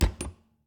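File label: Refrigerator, old fashioned, wooden, steel, brass clasps, hinges, close; Eiravaein Works; Vaeyan IV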